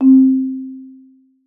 marimba C4; Xsample VOL-4

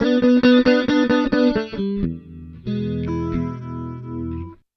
Blues, Electric & Acoustic Guitar Loops

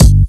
BASS HAT; E-MU Formula 4000 Series Vol. 1; Hip Hop Nation; Hip Hop Kit 2

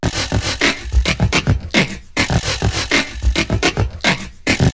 InHuman Beatbox 104, drumloops OldSchool; X-Static Goldmine

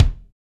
BD, DR BD; Best Service Gigapack 2

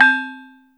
glokenspiel, Yamaha Motif ES drum machine; 300 Drum Machines; Yamaha Motif ES-Series

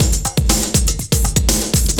120, LOOP, Roland; Sound & Vision - Gigapack I

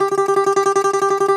DOMRA

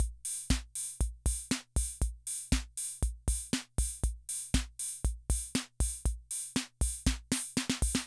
Loop, rhythm 55, classic machines

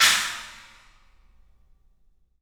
3D Percussives; E-MU Producer Series Vol. 5 – 3-D Audio Collection; P.A. Kit

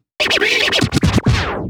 Vocal Scratches 140BPM

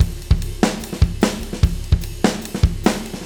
Stranger 147; Zero-G; Monster Beats

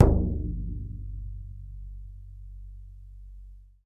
BODHRAN, Ireland; E-MU Producer Series Vol. 7 – Old World Instruments

